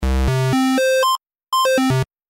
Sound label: noise wave pulse; Si Robertson